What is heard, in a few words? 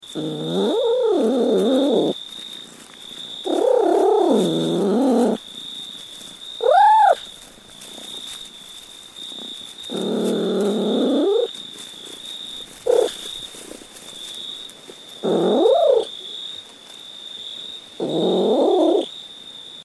funny cat sounds